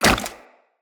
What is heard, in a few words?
Tenno Wall Jump Goo, footsteps, wall run; Characters